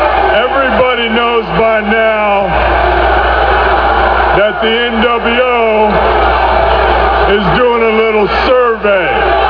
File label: hall